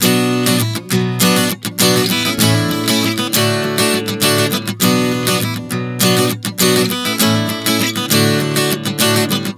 Progression 100 Bm7-A-D, Acoustic Guitar, Chord Progressions 100 bpm